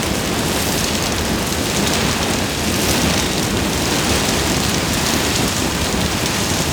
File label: hazard pyro loop; Gameplay; Stadium; Warframe; Nineteen Ninety Nine